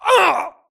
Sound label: grunt death quick; Titanfall 2